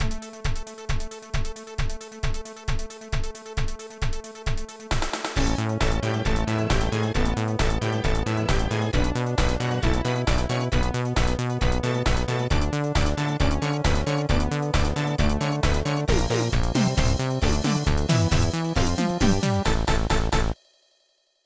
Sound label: Bonus; Cotton 2; Saturn